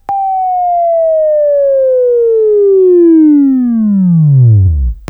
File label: WAV Right, Sweeping; Sweeping Training program